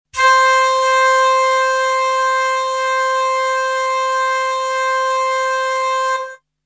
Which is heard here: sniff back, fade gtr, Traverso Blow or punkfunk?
Traverso Blow